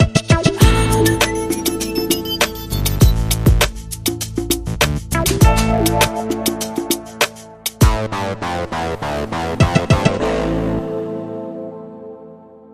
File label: Sonic R&B Groove